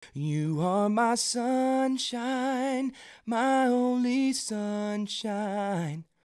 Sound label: sunshine fall off